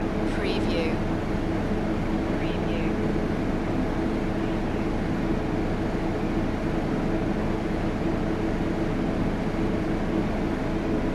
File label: bus air condition loop